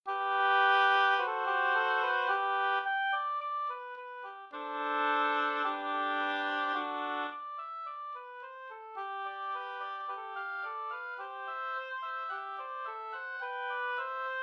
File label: Oboe Beginning Trios